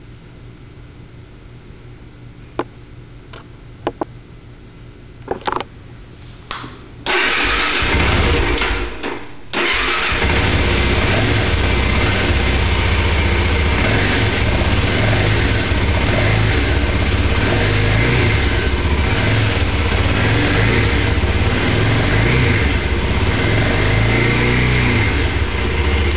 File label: Muffler, Motorcycles; Dakar mods